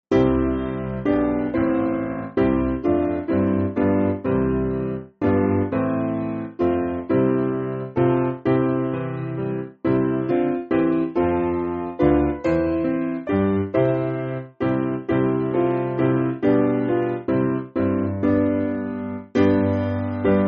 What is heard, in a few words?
Piano, Backing Files for Churches; Pelham